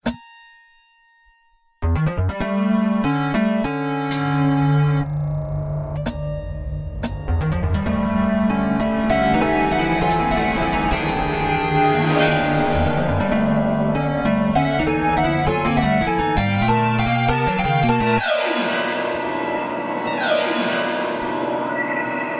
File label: essay for autoharp and electronics